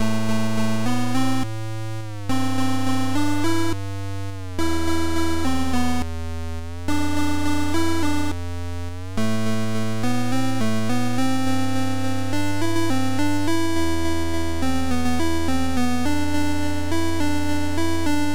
Piano

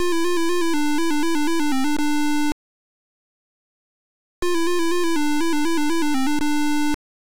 MELODY

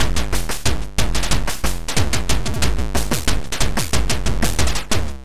BEAT

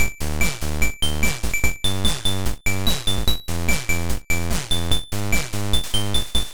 BEAT

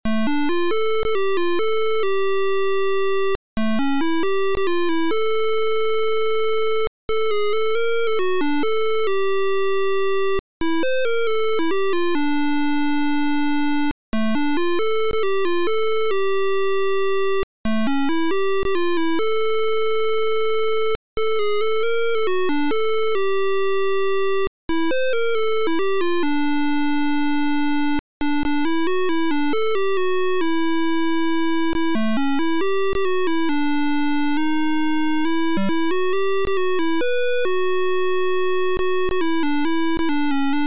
MELODY; beeper

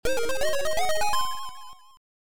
Jingle

